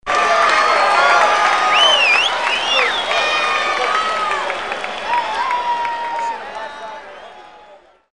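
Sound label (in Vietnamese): nhac tin nhan tieng vo tay, message tone clapping sound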